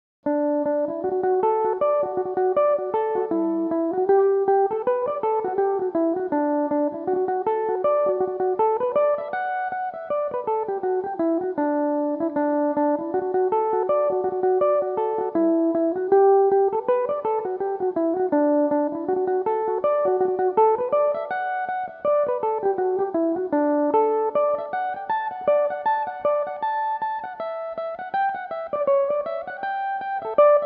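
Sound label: reel, D major, mandolin; Donegal Reel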